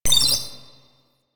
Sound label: Flying Robot Beep; Warframe; Veilbreaker; Quests; Ambience; Kahl